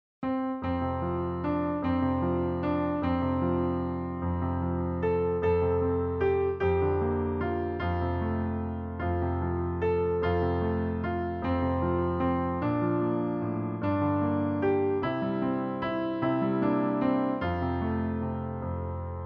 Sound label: partons la mer est belle instr